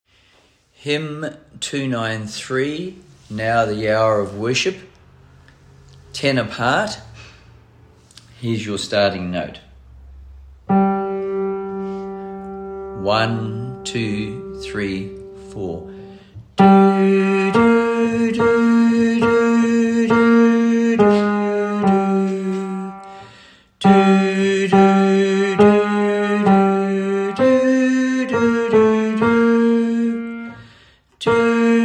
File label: Now The Hour of Worship is O'er Tenor; choir parts; Hymns for Jubilation